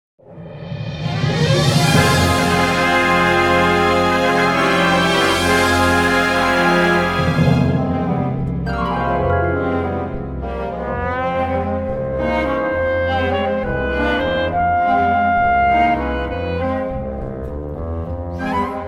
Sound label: Waltz